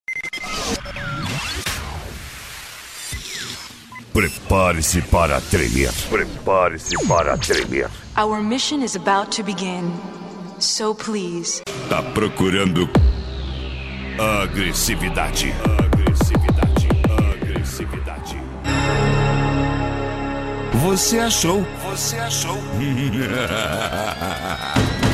agressive bass

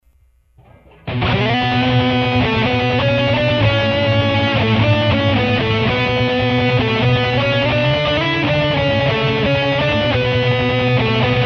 guitar riff

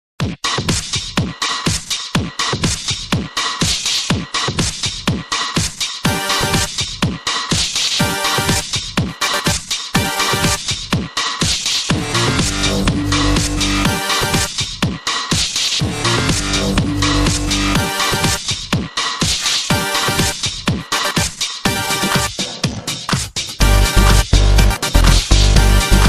future bass

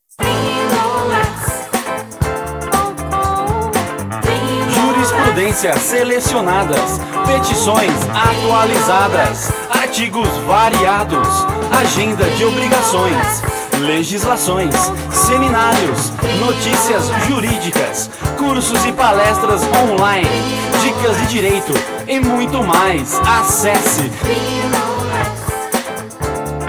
jingle; trinolex

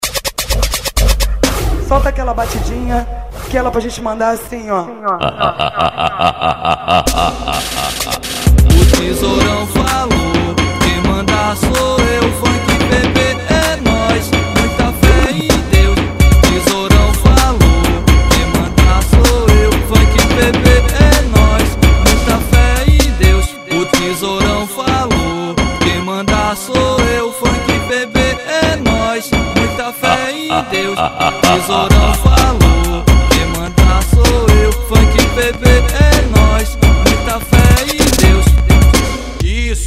funk; equipe funk pb

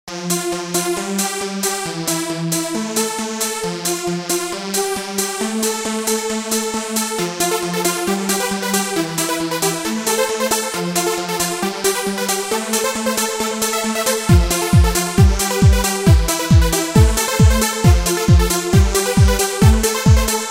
dance music

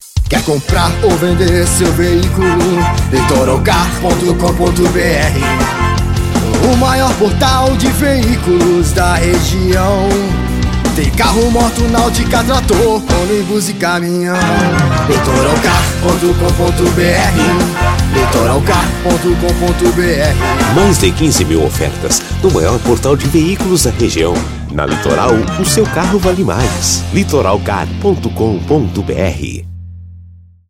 jingle; litoralcar

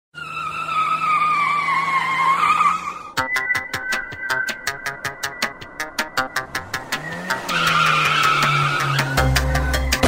rap; studio gn

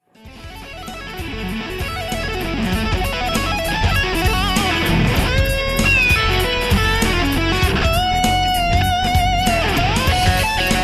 fusion lick

